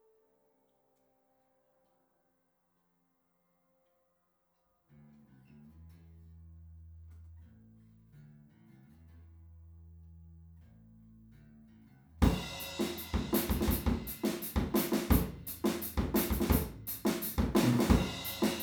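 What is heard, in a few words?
PZM Omni stereo Drums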